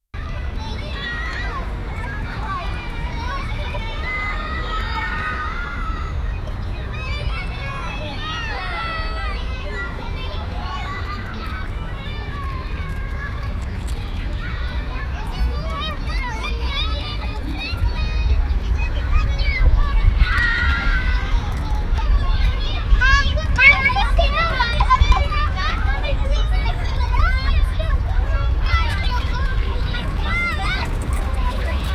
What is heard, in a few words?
park playground sounds